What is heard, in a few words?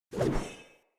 Sphere Polearm Heavy Whoosh Fill B, Weapons Player